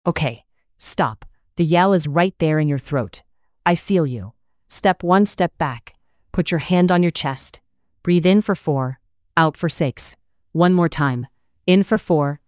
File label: yelling; bright steps